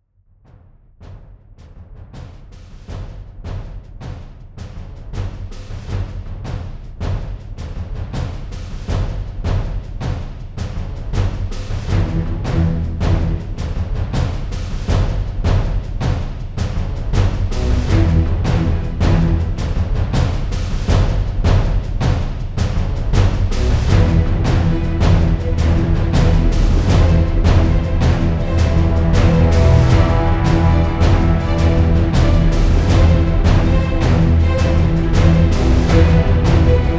Epic 120BPM; BlueFX; UmbraSum

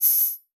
Multimedia Internet CD-Rom Flash Hi-Tech

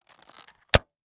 Technology Electronic Portable DVD Player Closing

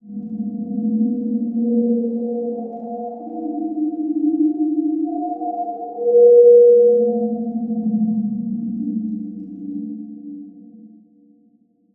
Title Transition Stab Dark Mysterious; Production Element

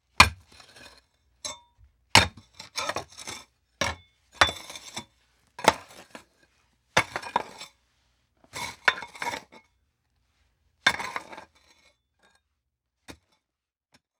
Industry Tool Dirt Shovel Dirt And Rocks

